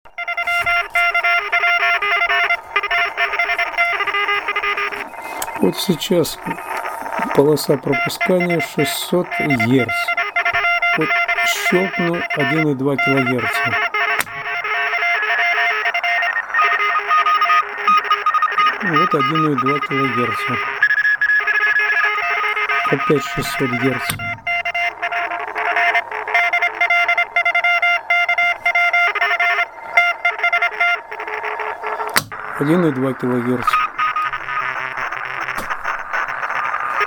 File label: Polosa 1kHz